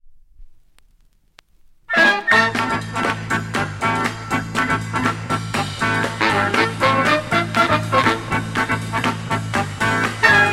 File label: drum diddley; German funk library single; organ